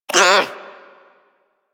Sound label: Armadillo Vocal Pain; Warframe; Hunting Critters